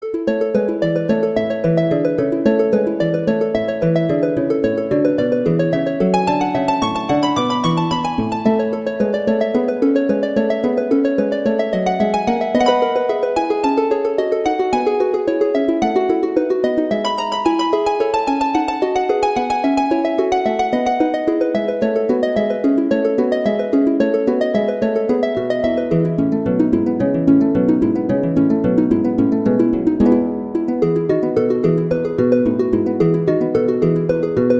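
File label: Allegro, Sonata violin guitar; Harp; Paradisi; Toccata Paradisi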